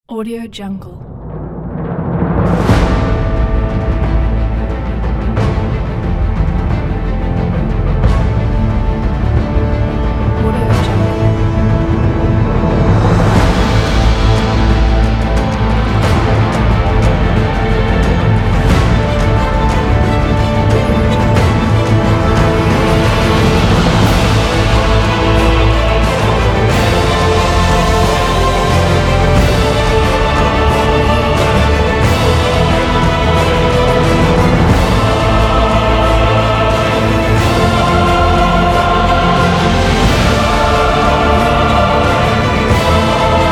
epic trailer

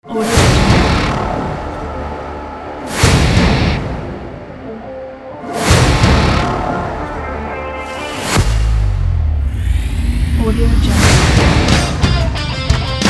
powerful rocking sport trailer

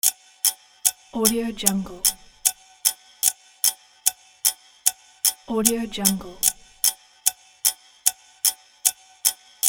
countdown clock

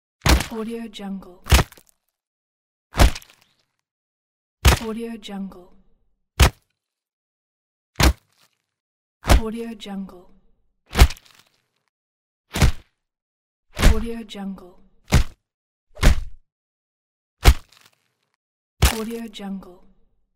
boxing punch pack; boxing punch pack 1; tibasfx

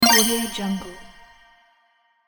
bonus magic; sonic-boom

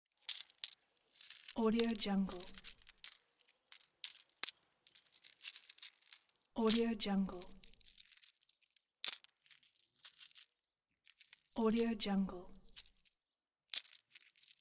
foley coins; savataivanov

